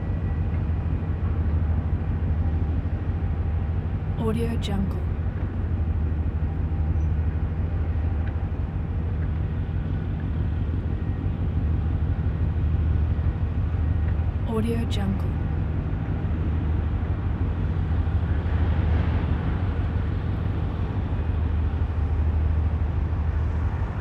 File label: container harbor background ambience